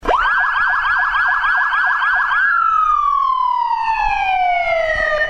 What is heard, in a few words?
SIREN; sioux city fire rescue pays tribute to 9 11 victims